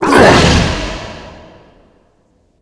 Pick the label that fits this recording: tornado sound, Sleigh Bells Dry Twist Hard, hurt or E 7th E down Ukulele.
hurt